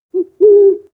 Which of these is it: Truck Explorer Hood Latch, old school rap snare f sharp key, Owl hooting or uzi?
Owl hooting